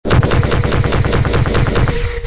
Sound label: strider; hl2dm